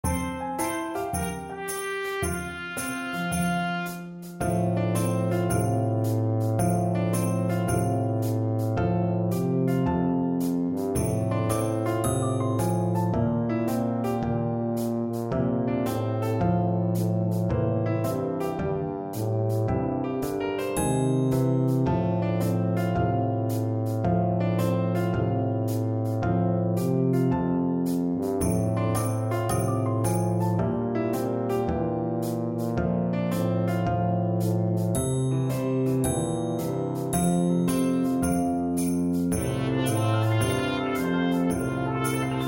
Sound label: Tuba Track with Melody; Jingle Bells